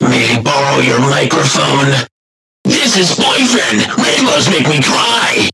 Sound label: Game Over